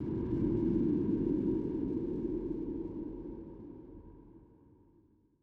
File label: transition music segment Tension; Hideout3; Tension Iceberg; Sifu